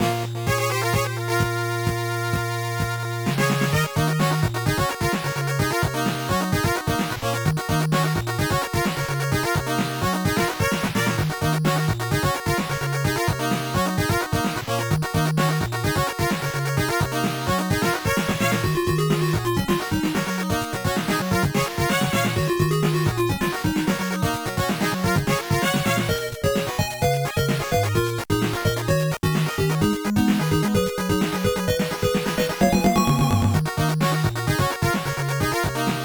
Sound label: Stage BGM; Mono~Stereo